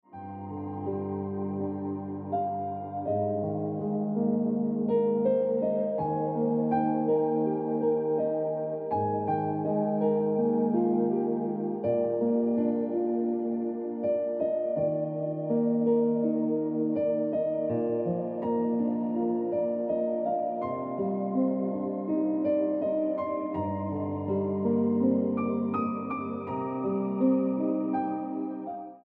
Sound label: Ringtone Piano Music